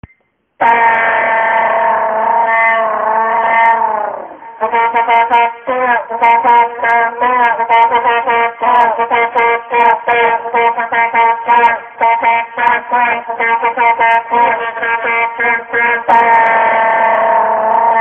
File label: encouragement ringtone